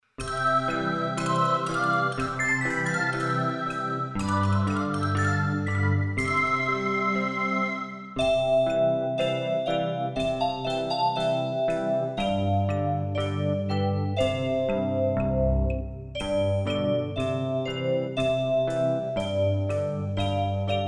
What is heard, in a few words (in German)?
kling gloecklein